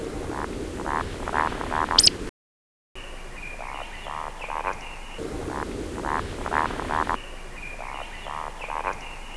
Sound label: Scolopax brachny polet, soundbirds